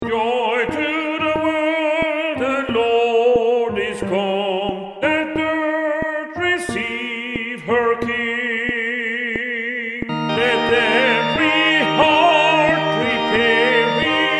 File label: tenor click; Joy to the world